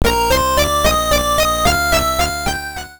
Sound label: Reunion Fanfare; donkey kong; gameboy